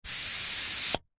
sport plastic hand pump single long air release pump nozzle end; zapsplat